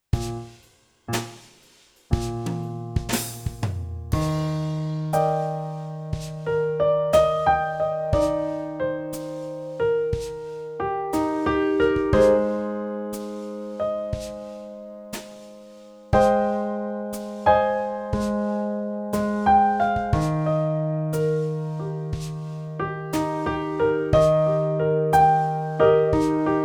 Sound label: Long notes improvisation Eb, brass